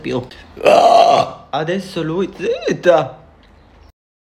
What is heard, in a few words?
blurp